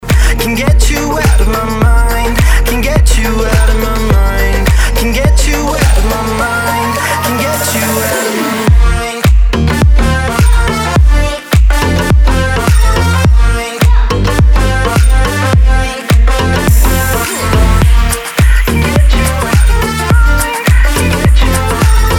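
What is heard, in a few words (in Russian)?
романтичный рингтон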